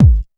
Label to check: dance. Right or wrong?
Right